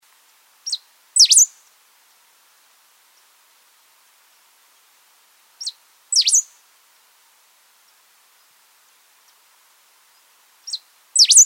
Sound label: pale throated pampa finch